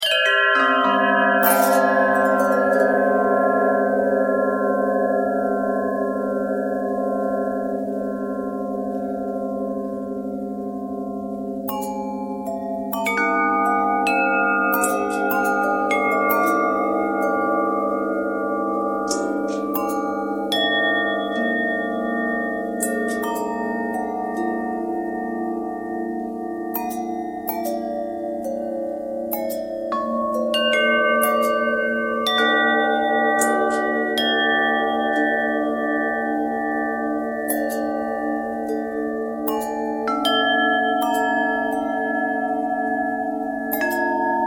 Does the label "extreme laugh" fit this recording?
No